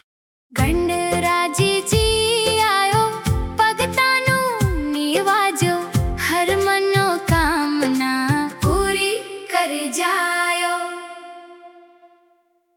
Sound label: punjabi ganaraj ji aao, ringtone; ganesh